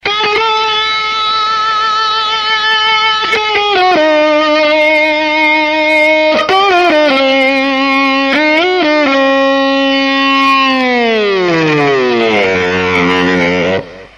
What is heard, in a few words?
Doordarshan Radio